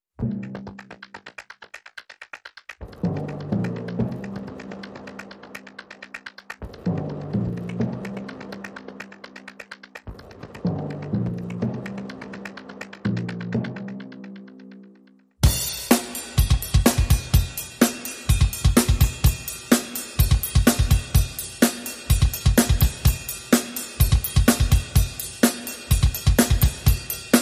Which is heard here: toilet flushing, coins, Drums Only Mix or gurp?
Drums Only Mix